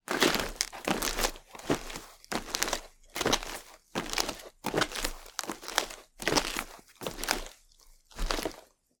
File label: Footsteps Male - Sneakers Cardboard